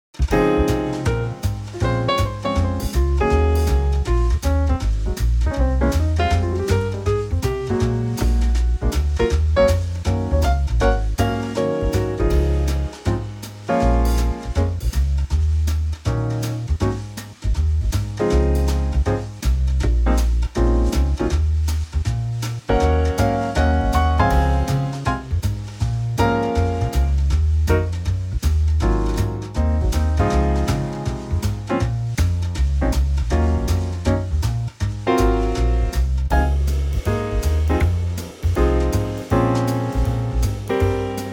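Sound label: D flat trio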